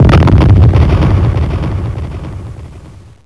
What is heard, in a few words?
nuke